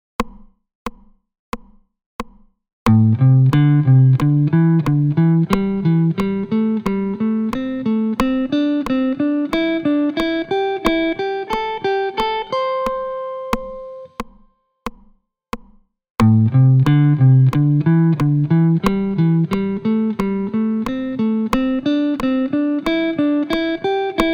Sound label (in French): Vers l'aiguë